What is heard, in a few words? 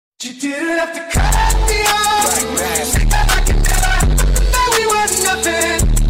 fart